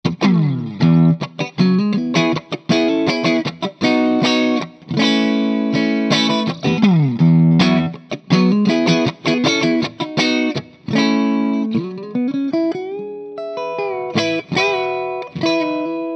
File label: clean; rockerverb 50